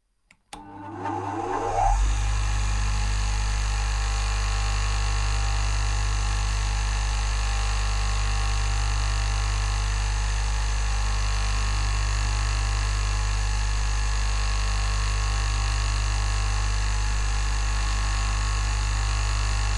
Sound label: carpenter tool vibrations; smirgell